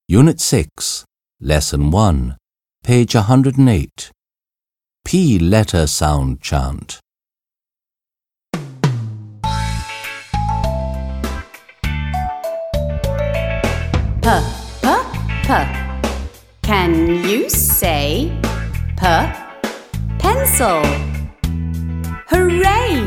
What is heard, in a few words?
P Letter Sound Chant